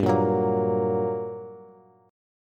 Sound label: G Minor Major 7th, down, Bass; GmM7 strummed; JGuitar